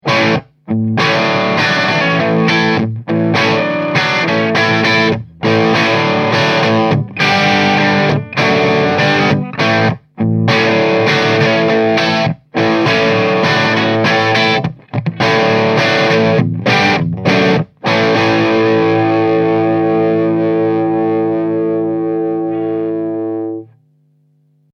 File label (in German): Mikrofon Crunch